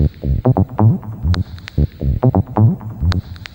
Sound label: Synth, Techno